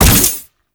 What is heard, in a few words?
Layer Shot, Quill Dart Gun; Hystrix Prime